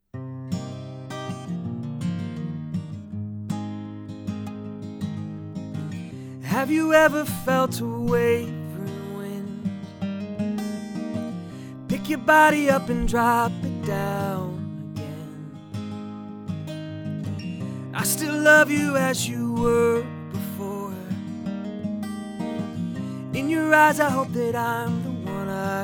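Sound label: Music, cardioid; usb mic; Samson GTrack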